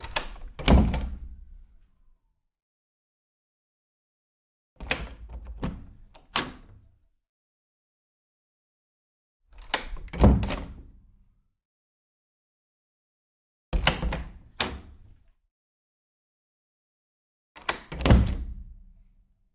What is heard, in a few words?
Door Wood Open Close X5